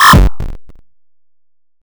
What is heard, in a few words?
bass, 8bit